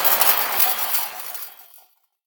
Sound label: QUAREM END, PERC; NorthStar - The Wizard VOL-2; TSAR PERC